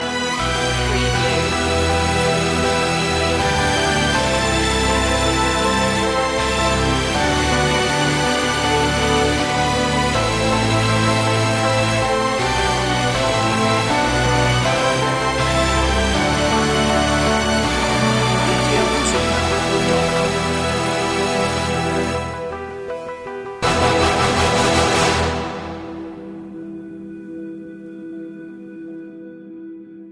no choir no perc

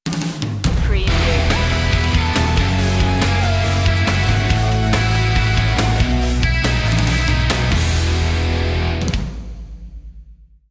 thunderbolt sting

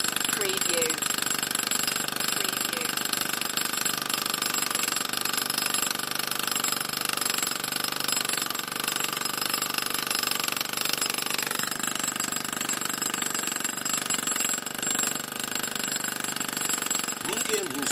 pneumatic hammer loop

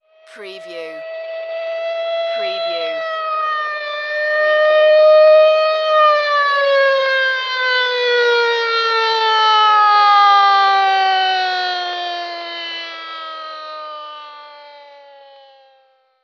Wail Siren Approaches Fades

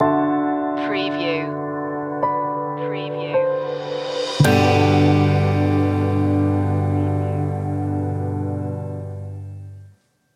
our last night stinger

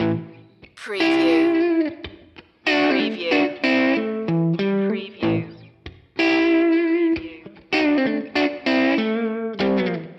sad guitar loop

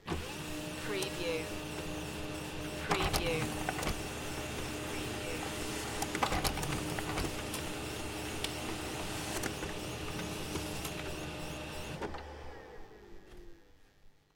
laser printer

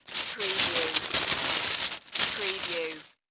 Wax Paper Movement; Sound Effects Bible

